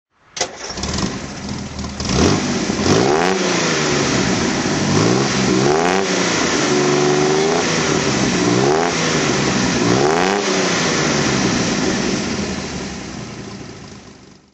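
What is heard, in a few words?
austin healey 3000 mkIII throttle, engine sound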